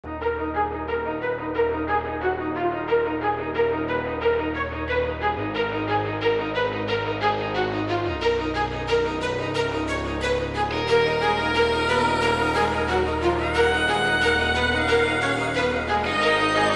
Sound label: hard motivational rap beat epic rock type hip hop instrumental